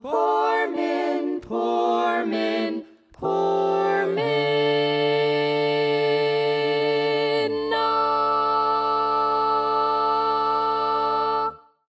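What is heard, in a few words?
Baritone, barbershop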